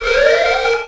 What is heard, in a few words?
alarm; Tony Hawk's Project 8; XBOX